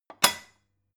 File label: stone bowl shelve hard